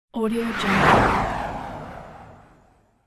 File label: rotating air passby